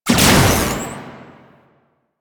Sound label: Shot, Spear; Warframe; Weapons; Tenno; Priest; Scourge Prime